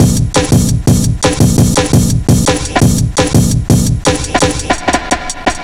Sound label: 170, drum loops, drum & bass